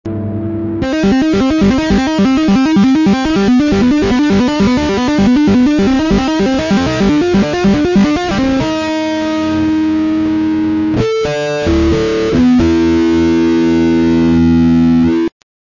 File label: big muff